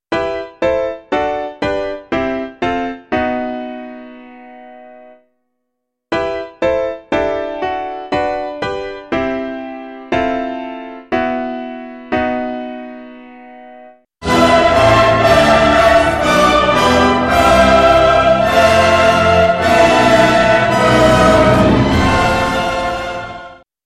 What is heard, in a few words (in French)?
marche; marche (harmonique)